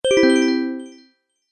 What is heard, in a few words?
Sound Close, Beep Sound Effects